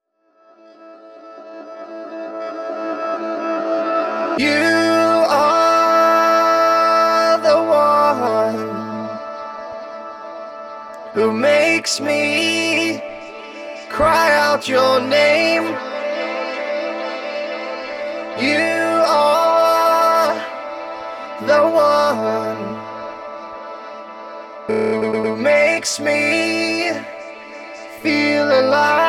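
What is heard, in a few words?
vocals loop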